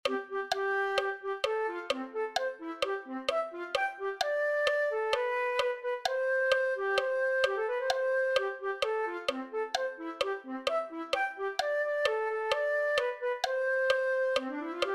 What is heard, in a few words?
flute